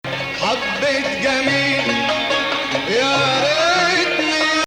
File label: Bayati Ah Ya Hilu Ajam